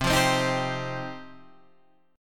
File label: F 7th Suspended 2nd, chord